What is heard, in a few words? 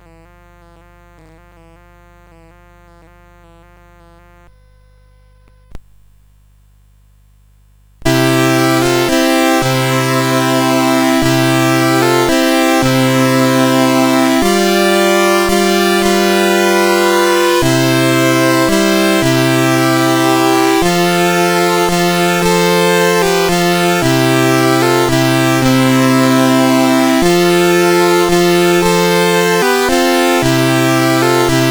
Silent Night, CSG8580